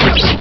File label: Spell Hit Object; Realmz 4.0